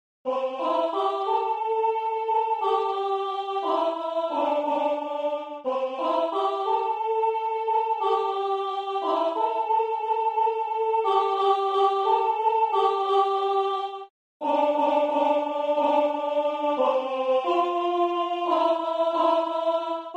soprani 1

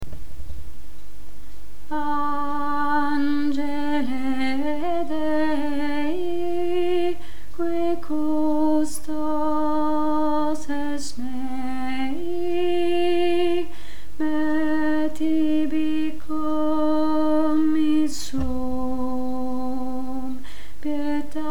contralto; Angele Dei

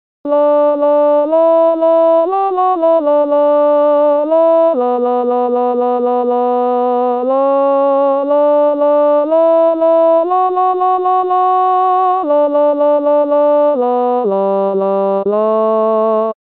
contralti

